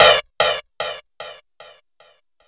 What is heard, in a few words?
127 DRM, Fresh Disco House